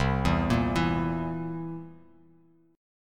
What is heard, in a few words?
C 7th C down, C7; arpeggiated; JGuitar